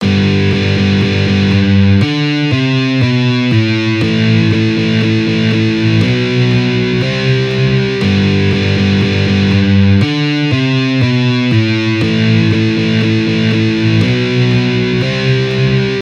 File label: Rests example